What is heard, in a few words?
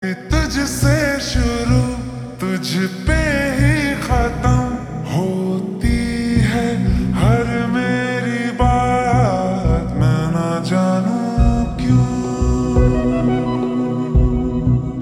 Ringtone Slowed Reverb